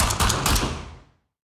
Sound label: hunters aid attack; heroes; anya